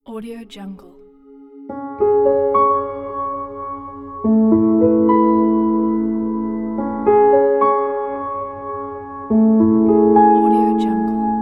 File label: sad piano melancholic trailer